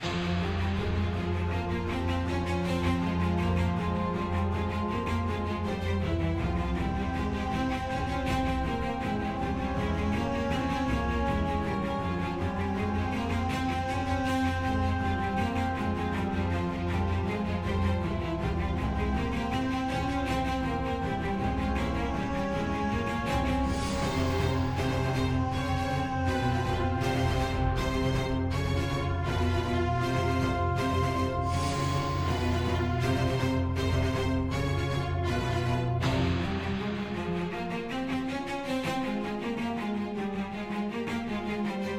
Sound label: Boss Battle Stem; Arcta